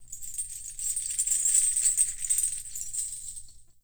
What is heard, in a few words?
Binaural Chains; Warframe; Shadowgrapher